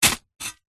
industrial shovel space metal single dig into gravel stones; zapsplat